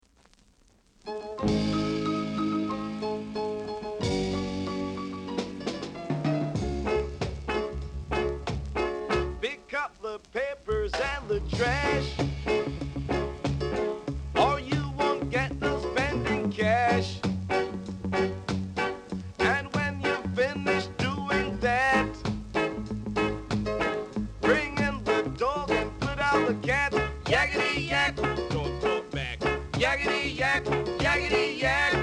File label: drum